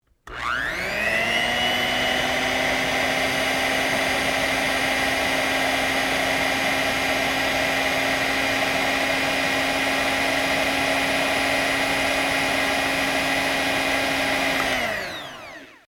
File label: hand mixer